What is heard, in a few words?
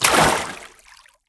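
footstep water sprint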